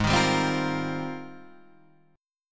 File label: G sharp Major 13th G sharp, down